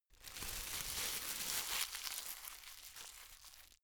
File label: plastic bag rumble slow